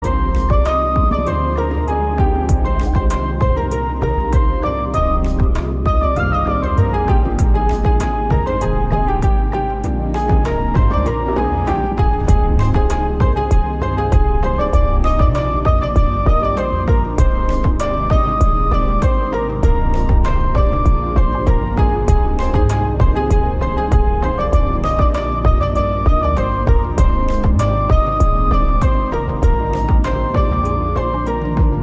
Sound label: SONG INSTRUMENTAL RINGTONE